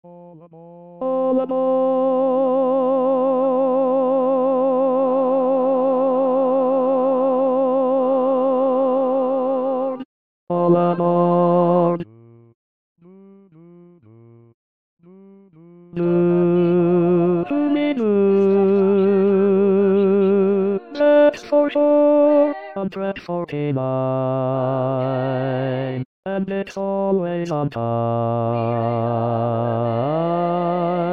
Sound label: tenor